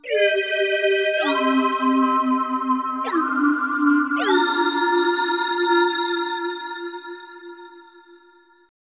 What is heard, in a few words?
synth pad ambient 160 C; Sphere